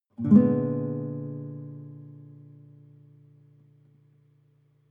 nylon guitar chord full diminished soft

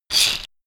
shaker wood rough hit single hard

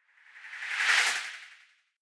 whoosh simple flutter phase high

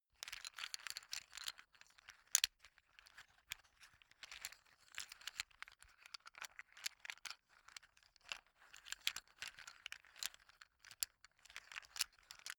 poker chips handle sequence soft

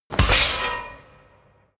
sword heavy sword

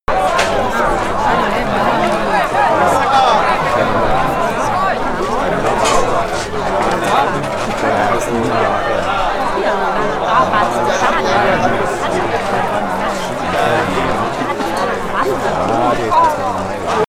in a room

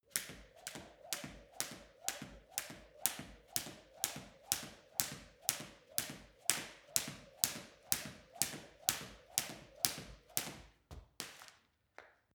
skipping jump normal

